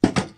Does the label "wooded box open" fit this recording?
Yes